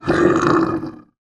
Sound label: animals bear growl; sound effects one